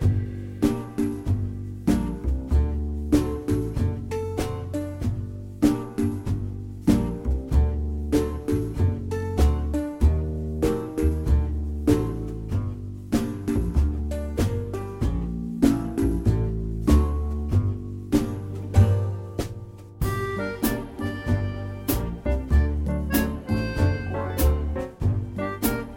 music

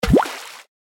fuel bonus; game

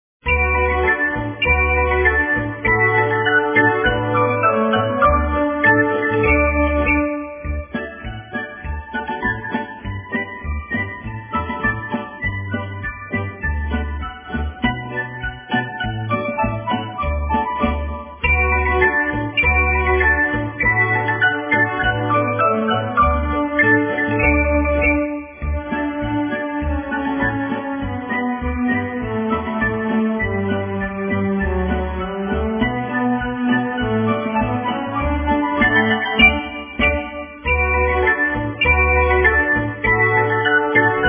bgm; EnglishTest

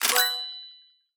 purchase; dapopinata

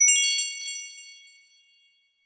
star move; game; santalicious

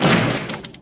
boom

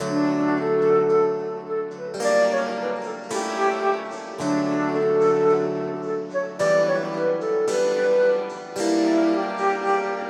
music Menu; guodongrenwu

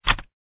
menu btn

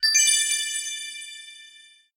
star earned; guodongrenwu

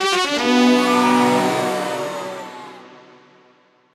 game over

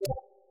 slide in; zhipai

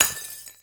glass; game; zuqiu

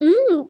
touch woman

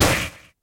explosion; zuqiu

